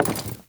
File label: Walk Wood, Footsteps; Enemy; Lady Deora